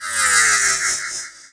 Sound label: Un Freeze; worms a